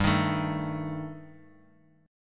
G Suspended 2nd Sharp 5th G down Guitar Open F, Gsus2#5 chord; strummed; JGuitar